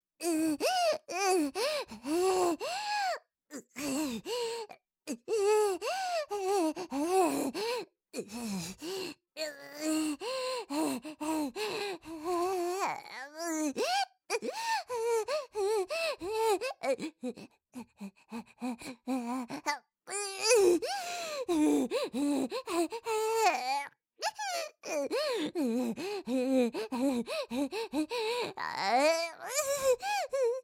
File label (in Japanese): 苦しみ 呼吸音 ボイス; お狐様